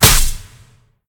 pwr hit; smite; gilgamesh

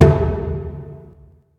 taiko high; smite; raijin

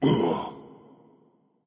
grunt death; smite; npc; olympus

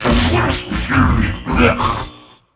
clear throat, voicepack; smite; jorm

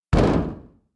bulk metal hit; Smite; Cabrakan

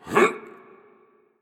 hitreact human male small

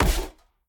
armor impact; smite; lancelot

